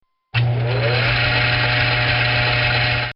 Lathe In Gear, Start

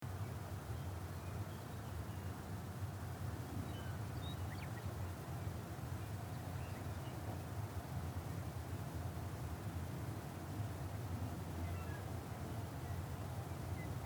Desert Utah, Desert Ambience, Daytime; Some Birds In B / G , Insects , Single Bird C / U; Outdoor; Outdoors

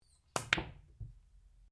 Billiards Cue Ball Cushion

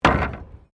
Wood Fall

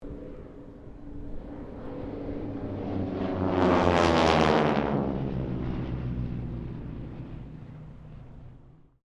AIRCRAFT HARVARD SQUADRON 3 TAKEOFF; Southern Knights Squadron; Airplane Sound Effects; Airplanes (Sound Effects:Vehicles)

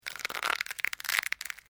Dry Brittle Snapping Long; Various Organic Foley sounds

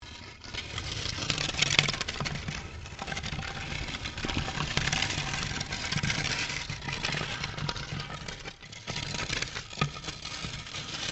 Stone On Stone Large Scrape; Material components for constructing more complex sounds; Scrapes

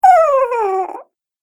Cartoon Game Voice Hurt Sound; Cartoon Voice Sound Effects

